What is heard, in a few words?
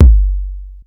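bass drum, Drum Machines; AcidLab Miami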